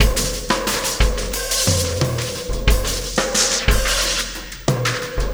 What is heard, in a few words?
Oscillation Beat; Sonic Foundry (Sony Creative Software); Processed Drumkits Zero Gravity Beats; Oscillations